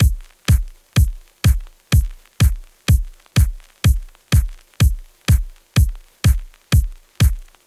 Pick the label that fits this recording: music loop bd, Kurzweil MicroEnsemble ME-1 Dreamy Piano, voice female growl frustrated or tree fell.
music loop bd